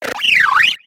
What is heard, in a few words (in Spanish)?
Grito de Unfezant